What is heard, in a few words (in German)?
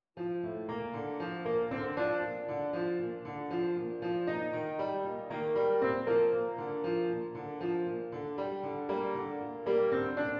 Klaviersatz